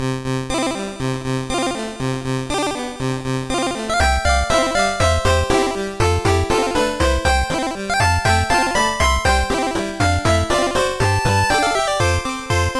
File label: 8Bit; DPPt